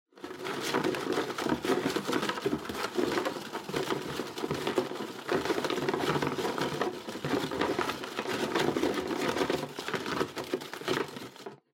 Drawing Box, Rustle

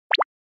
Motion Pop

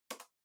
PC-Keyboard (Far-Space-Mid)

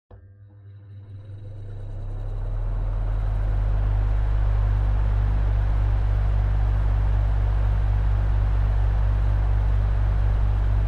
Electric Fan Mid

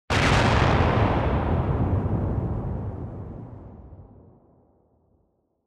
Explosion Long

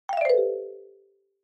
Xylophone, Fast Short Down

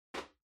Flip Clock Far Put

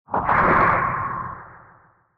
Retro Anime Robot High

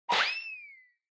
Motion Blow Away Short

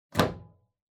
Wall Outlet Wall Plug In Hard

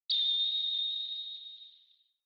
Retro Anime Accent High Long

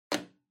Portable Cassette Put

